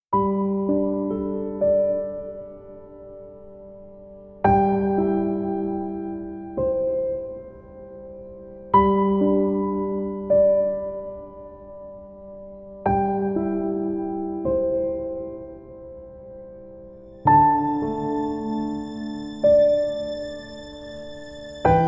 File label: Sad Music